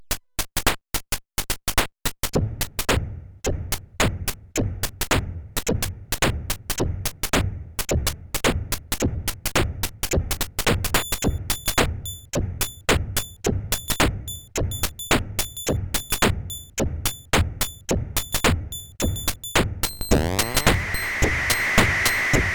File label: more noise multichip; weeklybeats 2026